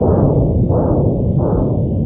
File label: scary amb